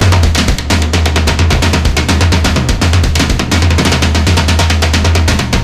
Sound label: drums; Left 4 Dead 2; Source Engine; video games; zombat; horde